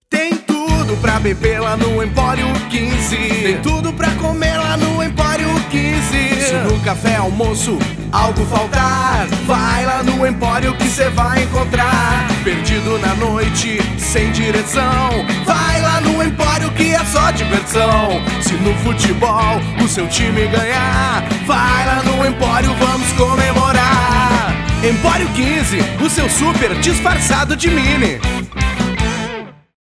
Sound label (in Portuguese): jingle; emporio